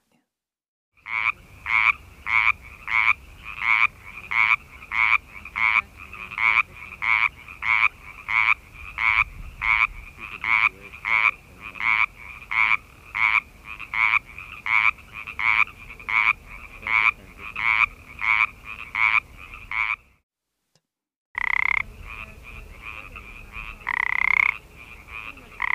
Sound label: Pacific tree frog enhance